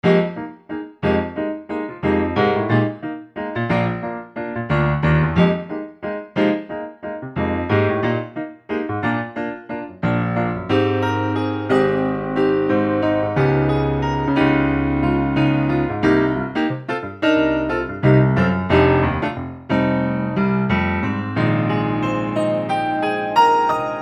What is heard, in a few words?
Piano and E Piano FM